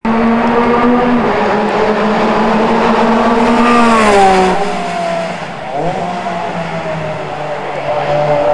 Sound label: porsche GT3